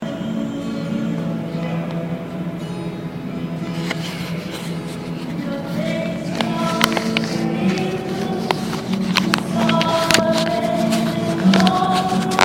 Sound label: sounds of the subway woman sings hallelujah on the st a train platform